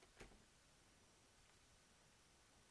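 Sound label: Door Close Open Interior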